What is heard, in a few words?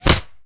Monster Generic Foley Hit By Bullet